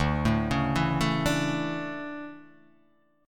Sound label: D Major 7th Suspended 2nd